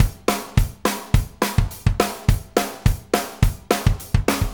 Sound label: Tamla, Drum Tools Various Loops; Sonic Foundry (Sony Creative Software); Loops & Samples Drum Tools